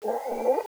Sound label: pyro pain